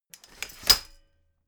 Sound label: folding chair fold medium